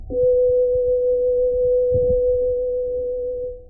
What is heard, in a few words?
violin